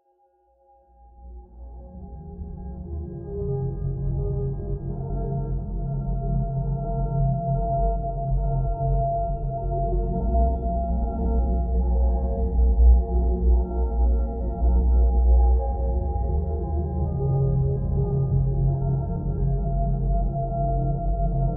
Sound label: Loading Screen Music; ascendance; Erokia; Time Lift